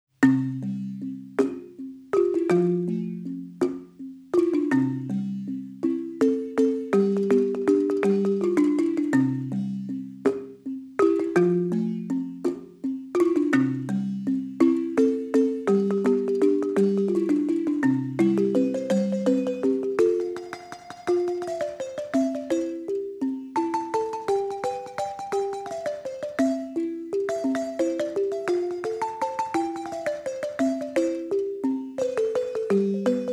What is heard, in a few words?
Balafon Interlude